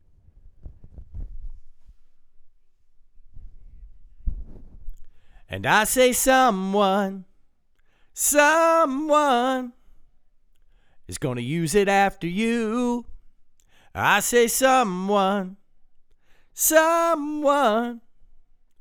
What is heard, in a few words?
vocals